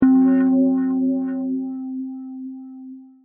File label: gamelan c5, synths